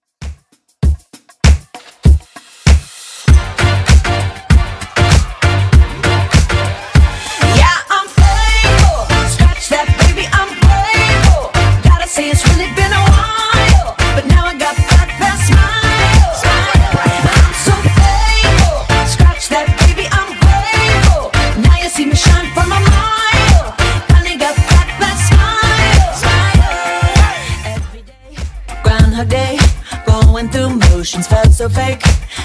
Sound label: Top40 Redrum 98bpm